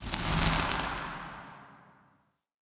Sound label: Soft Flame Burst, Ambience, Crypt; Champion Crypt